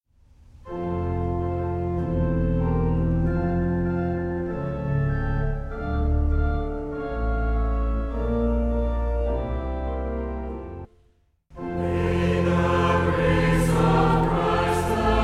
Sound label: Hymn